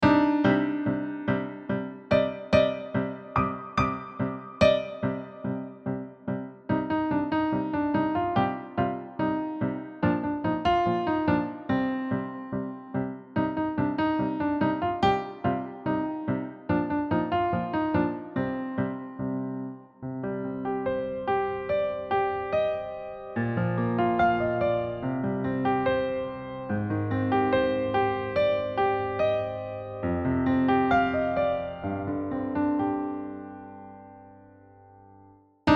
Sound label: Intruder Alert, piano solo for elementary; galactic adventures